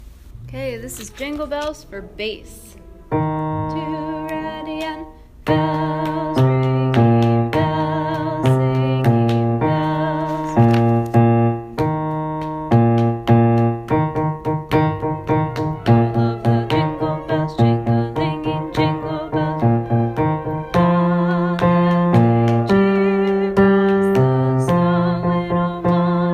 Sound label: jingle bells bass